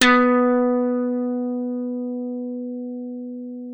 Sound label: B3, slap thumb; Xsample VOL-1